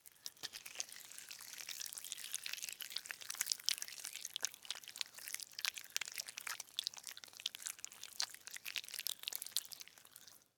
Worm Crawl, Animal, Worm, Crawl, Movement, Slimy, Wet, Long; Insect; Bug; BlastWave